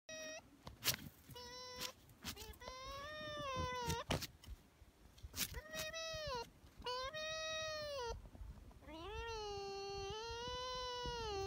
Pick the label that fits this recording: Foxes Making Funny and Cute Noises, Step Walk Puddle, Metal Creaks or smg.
Foxes Making Funny and Cute Noises